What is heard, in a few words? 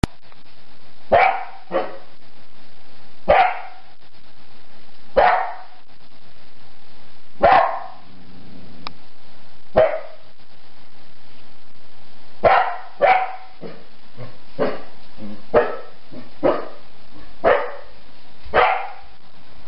Bark